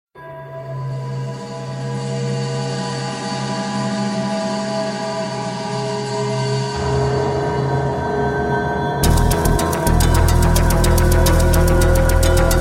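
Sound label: crime music without words for background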